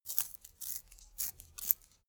sharpening pencil

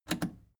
plastic button scratch smooth click enable fast

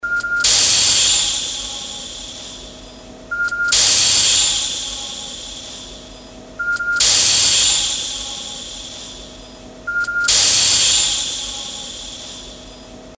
noise loop

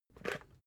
water boiler pick up slide

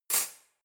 cutlery knife drawer place hard

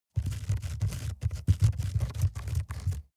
rat run trample fast scrape

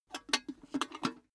cash box metal lift handle

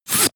air pressure release

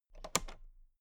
toolbox latch close soft

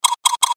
alien

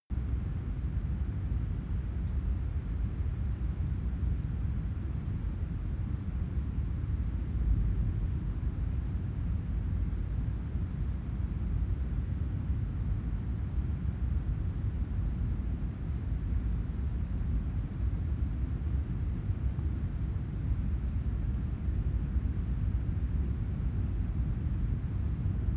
winter lake frozen

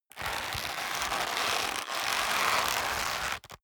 leather creak hard

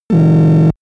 80s alarm

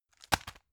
tissue package drop hard

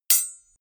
knife hit metal ring short

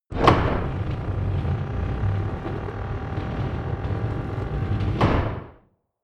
gate wood medium open

